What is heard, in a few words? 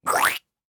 splat; GGJ22